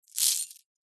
gemstones in small pouch tip out; zapsplat

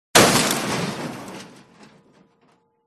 crashes metal; Audio Mangler

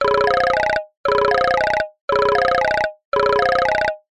cartoon telephone ring

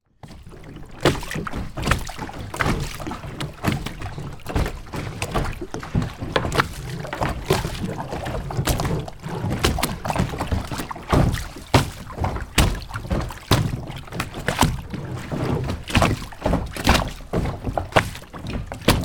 boat kayak floating water waves hit calm

